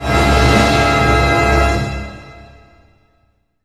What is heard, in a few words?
HIT B, Orchestral Loops; 128 HITCHCOCK